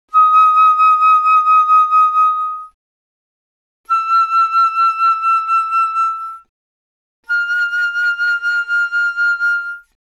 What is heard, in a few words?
Flute; 気鳴楽器; AirReed